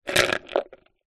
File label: office pencils in plastic large pot movement; zapsplat